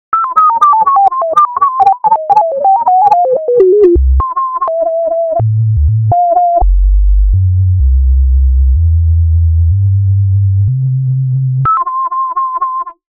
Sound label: Hi Wind, Windspeed; smart city